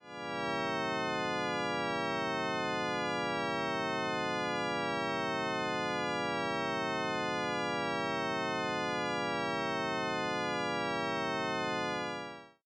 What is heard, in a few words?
shepard